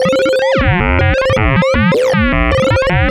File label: Random, LFO & Noise; Sonic Foundry (Sony Creative Software); Prototechno